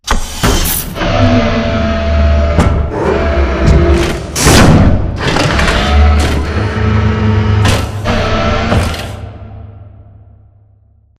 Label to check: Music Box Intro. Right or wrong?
Wrong